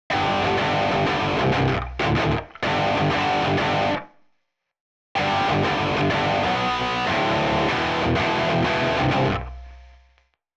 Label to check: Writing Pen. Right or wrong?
Wrong